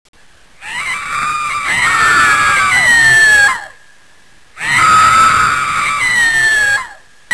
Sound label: banshee; brainbread; cemeterytrouble